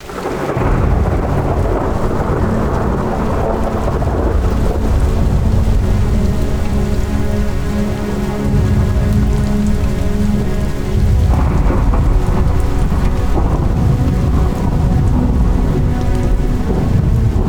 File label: Intro Sad; ufoai; Crystan